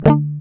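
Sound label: GUI D, acoustic guitar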